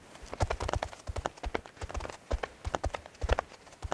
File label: Crawler Walking Loop; gamerip; Mines